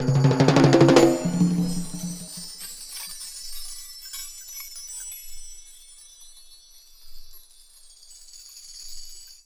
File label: PERC; Houseworx; Drums